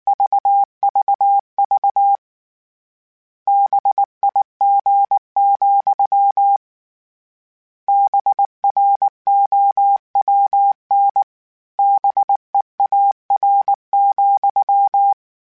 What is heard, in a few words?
Morse Code, CW Code Groups; Mixed